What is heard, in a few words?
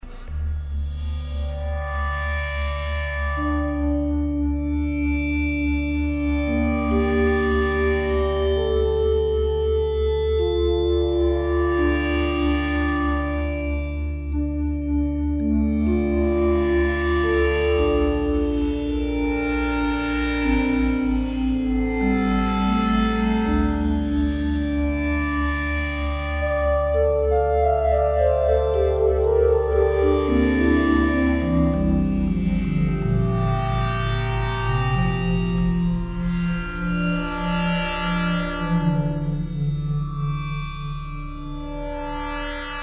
Schmidt Spheric